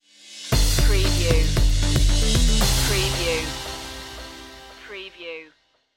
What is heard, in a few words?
future technology stinger